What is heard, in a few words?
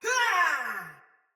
Battle Cry Soldier Mace Agent Female; Sinecure Melee